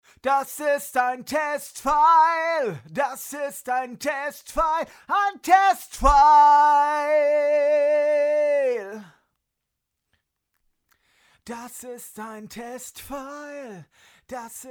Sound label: vocals TLM192 Preamp